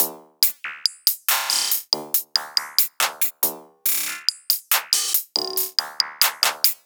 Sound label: 140 bpm Perc Loop, Dubstep; Iron